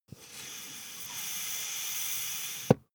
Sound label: air pump ball slow